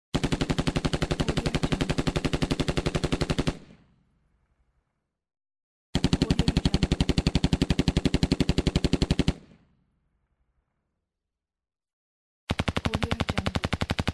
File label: machine gun